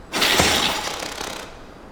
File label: Ant roar; Skull Ant